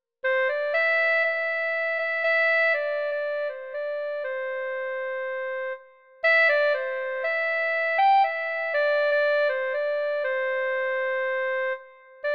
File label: melodica